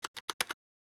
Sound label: Keyboard; keyboard 1 med tapping 01